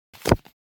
Casino playing cards put down a deck of cards

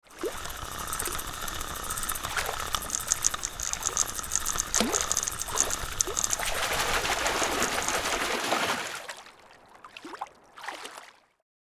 Fly fishing catching a fish

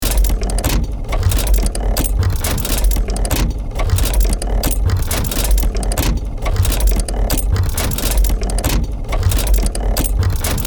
Industrial machine mechanical loop

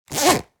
Money pouch zipper; purse

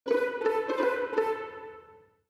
Cartoon funny mocking

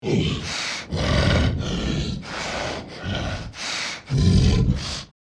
Werewolf breathing heavy monster

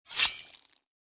Bloody gore cutting

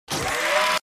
Minigun windup starting

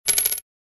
Game coin drop

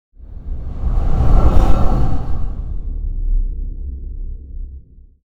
Spaceship pass by fast

